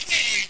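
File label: die, alien controller; HL2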